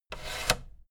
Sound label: fader plastic scrape double up medium